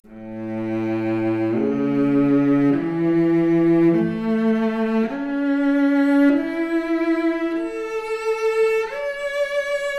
Violoncello arp, Stereo output noised; 3bit

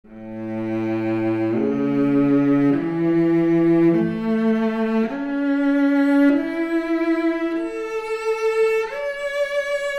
Violoncello arp, mp Stereo

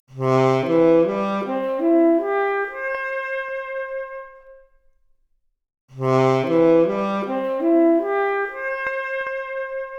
Saxophone arp, mp Stereo output noised, 4bit

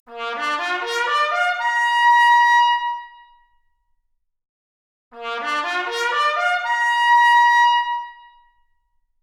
Trumpet arp, Stereo output; 4bit

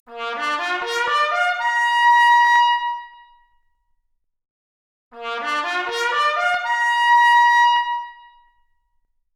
Trumpet arp, Stereo output noised; 4bit

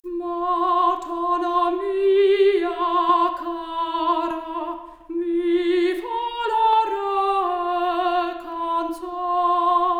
Soprano Stereo output noised, 3bit

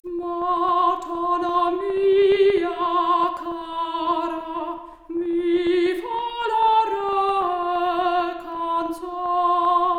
Soprano Stereo output noised; 4bit

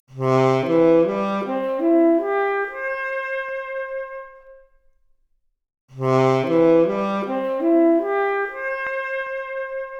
Saxophone arp, Stereo output noised; 4bit

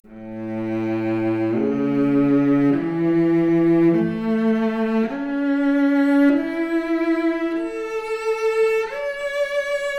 Violoncello arp, Stereo output noised; 4bit

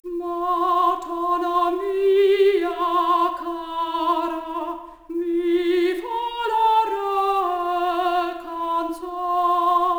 Soprano Stereo output noised, 3bit

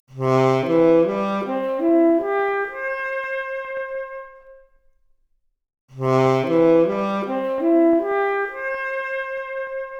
Saxophone arp, Stereo output noised; 3bit

